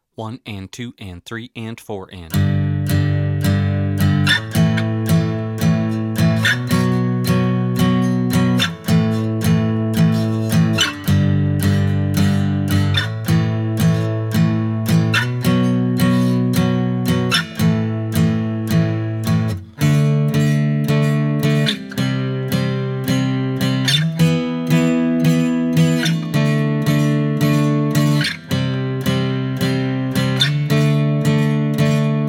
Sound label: Acoustic Power